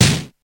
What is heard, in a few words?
loud snare one shot D# key, Royality free snare single hit tuned to the D# note.; snare drum samples; one shots